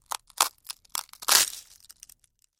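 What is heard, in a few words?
waffle sounds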